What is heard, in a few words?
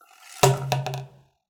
Cardboard Tube